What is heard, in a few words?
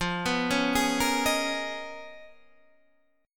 C sharp 13th F